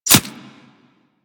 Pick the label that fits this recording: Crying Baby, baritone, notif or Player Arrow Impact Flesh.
Player Arrow Impact Flesh